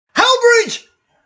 pen voice, vehicle

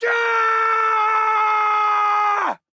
male generic warcry; Voices Emotes

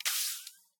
hypospray

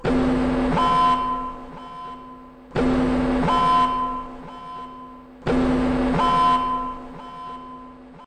red alert

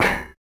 metal grate, Footsteps; civ14; Plating

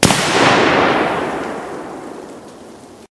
ptrd, Guns Fire; Weapons

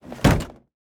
closet close; Vehicles; Civ

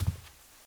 carpet step, Footsteps; Civ, Effects, Carpet

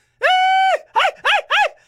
charge, Voices Emotes

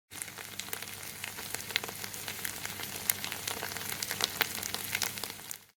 sizzle; civ13; civ14

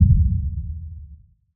explosion small far

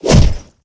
kick, melee; Weapons

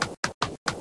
charge crab, Voices Emotes

